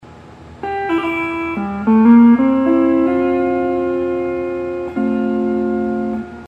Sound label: hawaiian piece